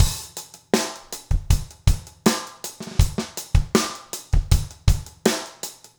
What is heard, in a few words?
trip hop 80